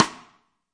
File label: real snare; REAL LIVE KITS; A Real Freakin Drum Kit